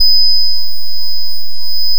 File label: additive cello single; manhattan